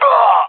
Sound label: death sound; lego star wars; boba fett